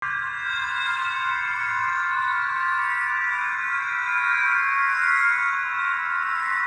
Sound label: Ore Idle; Radion; Gamigo